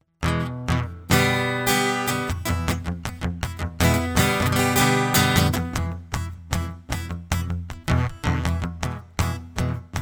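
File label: acoustic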